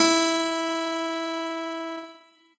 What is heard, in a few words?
piano